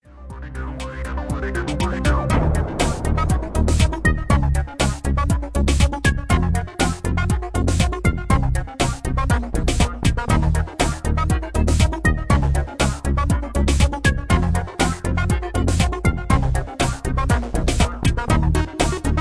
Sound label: Electro